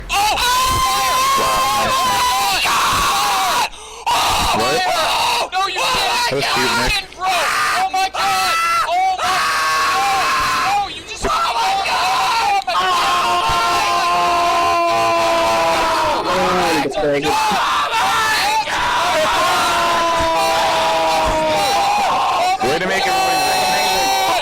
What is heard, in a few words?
screaming